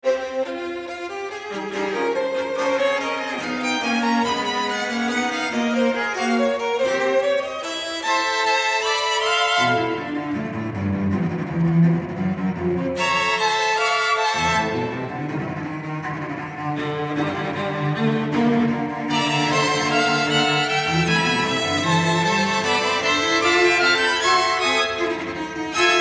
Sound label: quartet; shostakovich